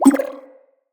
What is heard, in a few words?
Thank You Bubble, UI; Blessing Device Menu